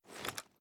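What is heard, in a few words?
reload empty fast mag up, shotgun; reloads; iw8; romeo870; gmod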